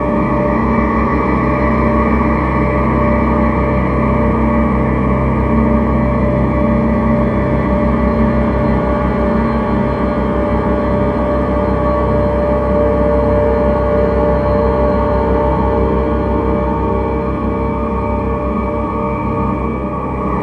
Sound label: Drone; Sonic Foundry (Sony Creative Software); Hydroponic Hip-Hop